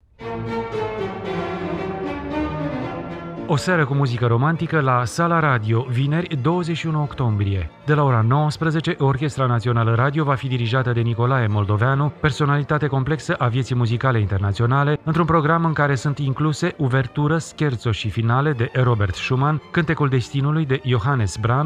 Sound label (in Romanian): PROMO Simfonia de coarde nr 5 in Si bemol major